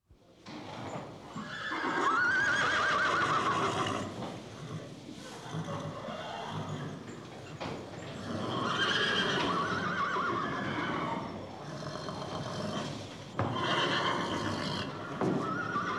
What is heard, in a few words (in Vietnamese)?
am thanh trong chuong ngua noi co ngua